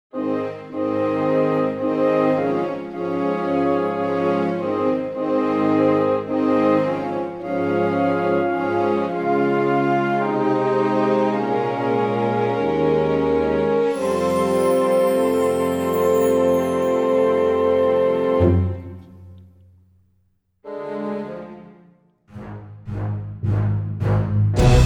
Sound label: backing track